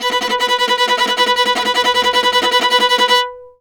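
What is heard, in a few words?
VIOLA tremolo B4; Orchestral; DirectWave; Varazdin Orchestral; Viola II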